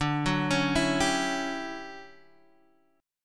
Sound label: D Major 11th Guitar Standard, chord; arpeggiated; JGuitar